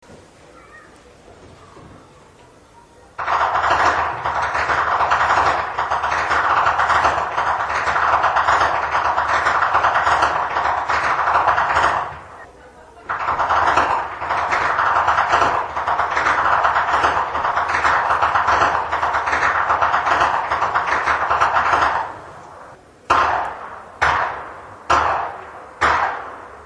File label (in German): schnalzen, flachgau; steindorf